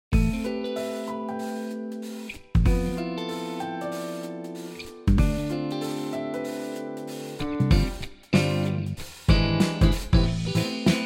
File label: guitar piano